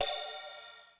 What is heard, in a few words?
Ride, Korg DSS-1 Drums